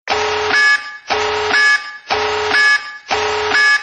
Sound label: Nuke; Mw2